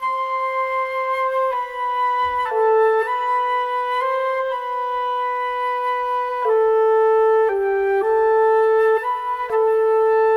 Cinematic Winds; Sonic Foundry (Sony Creative Software); Orchestral Series VOL-3; Cinematic 22

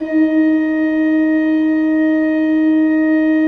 D3, Cathedral Organ; ILIO; Propeller Islands Cathedral Organ

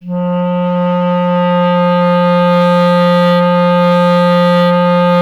clarinet F; Miroslav Vitous; Solo Instruments VOL-1

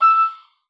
OBOE2, E6; Xsample VOL-6

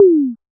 Kit Tom, Realistic Concertmate-670; One Shot Kit

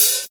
HH OPEN, AMBIENCE HI HAT

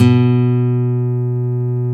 guitar nylon, classical; GTR; Roland LCDP02 Guitar and Bass; Nylon String

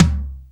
FLOOR TOM; Back In Time Records; Big Bang

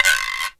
GUIRO LONG; THE JAZZ

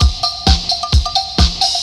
130 DISCO; USB Soundscan vol.20; Fresh Disco House I; AKAI; DISCO ADD

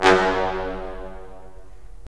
BRS, Roland LA Composer

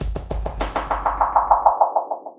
drbreak, drumbreaks; Masterbits; Vinyl Attack

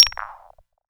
VM1 Electrical Marbles; 300 Drum Machines; DevSnd

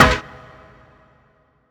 Dance Mega Synth; Best Service; 90 s Sample CDs

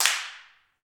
PRC HNDCL, Clap; Rhythm Section; Clap & Snap; Clap Modules

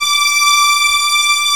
VIOL, ORCHESTRA; AKAI S6000 CD-ROM - Volume 1

